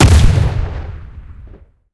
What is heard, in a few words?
mollins 57mm shot, guns; War Thunder